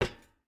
footstep boots metal run; Rust

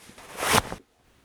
Inf Weapons Foley; Red Orchestra 41-45

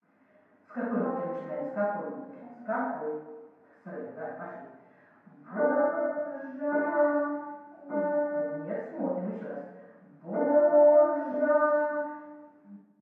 ambient port; World Of Warships; port3d

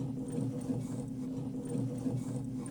Throttle, Aircrafts; DCS

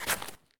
jump concrete; Escape from Tarkov

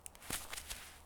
cloth generic use; Escape from Tarkov

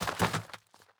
bodyfall; Rust

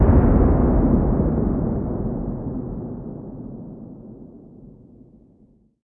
plane; World of Tanks

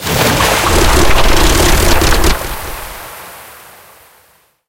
prop dead water

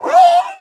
flinch; Rust

